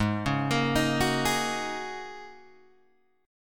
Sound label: G sharp 9th Suspended 4th, Guitar, Open G; 6,6,8,8,7,6; down; chord shape